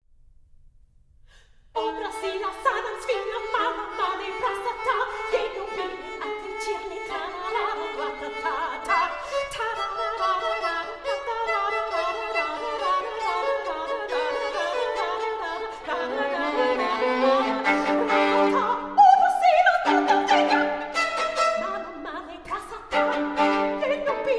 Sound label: duos for 2 violins scherzo